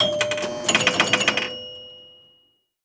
Electronic Pinball Machine Buttons While Playing Short; AdobeCC